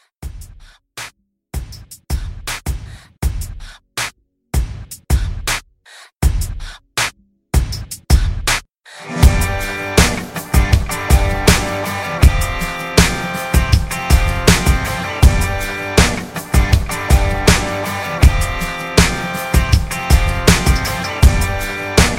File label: Country 80